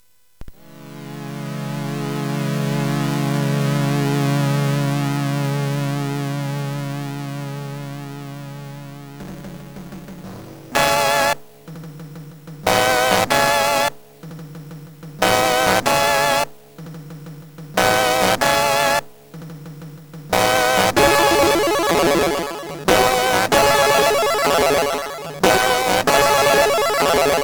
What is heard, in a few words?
Orchestra